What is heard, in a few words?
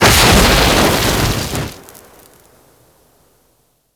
Flame Burst Short; Warframe